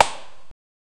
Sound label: a shot from a crossbow, projectile